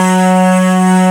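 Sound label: rattle; E-MU Producer Series Vol. 5 – 3-D Audio Collection; 3DSprints; 3DPnoHybrid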